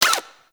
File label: Necramech Repair Drone Idle Short; Enemies; Warframe